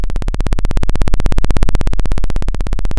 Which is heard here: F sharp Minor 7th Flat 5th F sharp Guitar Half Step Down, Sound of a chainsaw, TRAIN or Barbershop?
Sound of a chainsaw